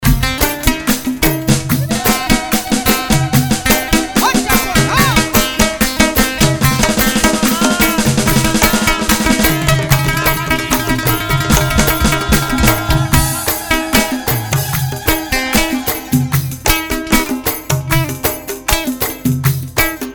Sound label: Chaponi Oud